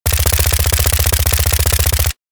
Sterling submachine gun suppressed burst

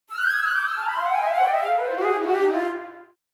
Game over you lose fun playful

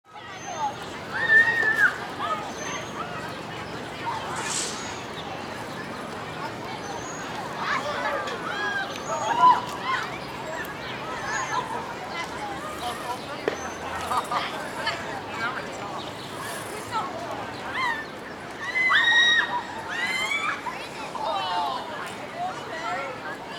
Suburban park ambience playground

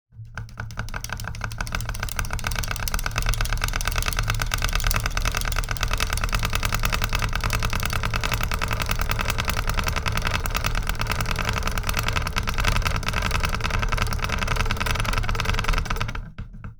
Bench grinder rattling; Industrial Machinery; gfxsounds

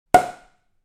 Champagne bottle cork popping

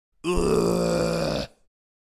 Middle age male throw up

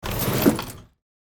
Small drawer closing; household

